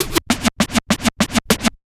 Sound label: Scratch, Trance